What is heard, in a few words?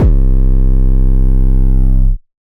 808 Sample C1; drum samples; Bombz